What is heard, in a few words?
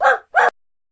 bark